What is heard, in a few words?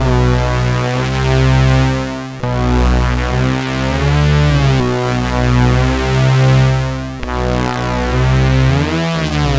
C64 Bass, Commodore 64, Chiptune; 8-Bit